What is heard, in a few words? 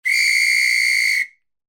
WHISTLE REFEREE